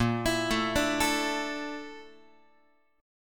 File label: A sharp Major Sharp 11th, Guitar; arpeggiated; chordshape; x,6,7,7,6,8